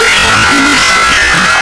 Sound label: jumpscare sound; fnabh a childs past